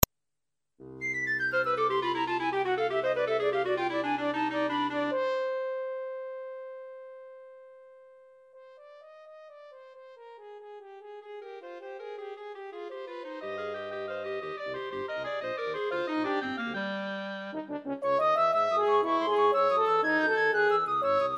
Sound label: quintette